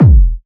Techno, Kick; Techno Sounds 2024

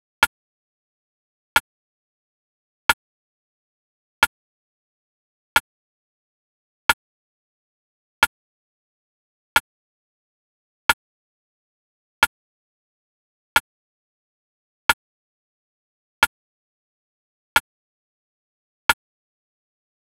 Drum Loop - 90 BPM Snare; Loop Stems; Cymatics; Mystery Pack Vol 4; Pillow Talk

